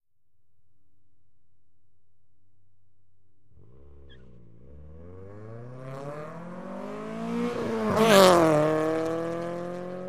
Integra, quick approach, very fast by, long accelerate away, Acura Integra